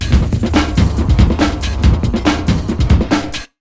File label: Big Beat Drumloops; RUN 140; USB Soundscan vol.25; AKAI